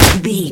kick heavy impact

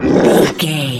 troll monster battle grunt

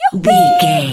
voice fun character vocal crazy

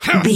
voice male attack

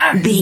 voice male hurt pain

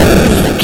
retro explosion short

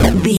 retro impact hit general

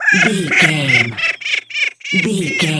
goblin fairy laugh intense

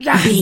voice male attack

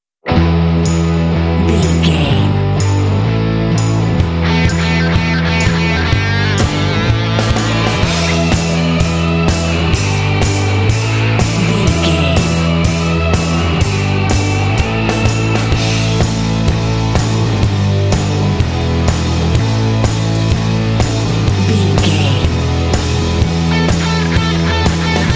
rebel biker

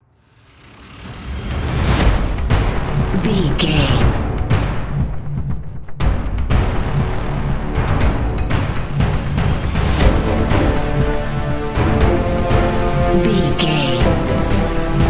music modern war

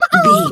chicken bwak

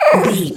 goblin fairy hurt pain

